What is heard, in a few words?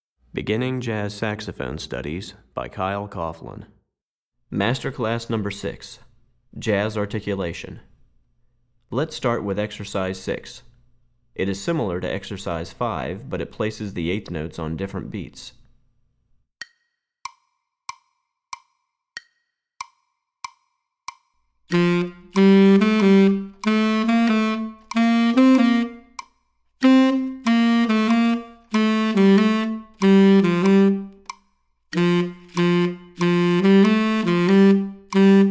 Tenor Class, Saxophone; Jazz Articulation; Master Class #6; Recordings For Beginning Jazz Saxophone Studies for Tenor, Volume 1; Kyle Coughlin